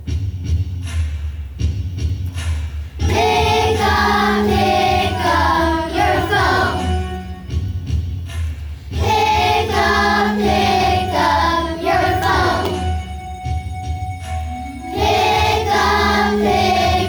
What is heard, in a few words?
ringtone